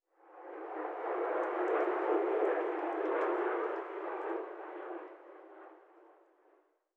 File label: airplane, ambient; dust2